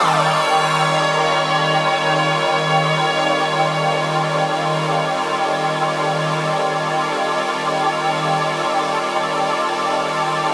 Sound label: Long Atonal Pad